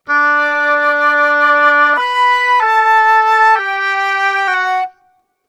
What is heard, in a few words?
95 flute+oboe, Orchestral arrangements, 95 D maj